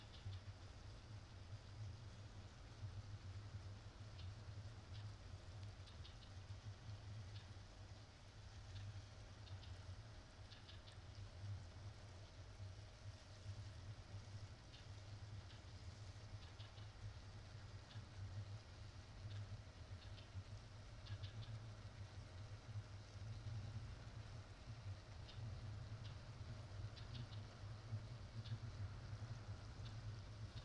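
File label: hammer killer whale playback; hammer-kw playback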